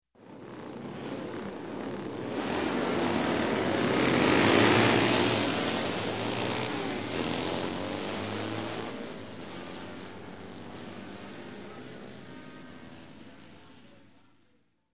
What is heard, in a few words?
Motorcycle, Race Supervintage Start Of Race; Sound Ideas; Series 2000